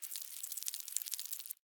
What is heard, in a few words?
Animal Insect Bug Movement Walk Run Foley Slime Juicy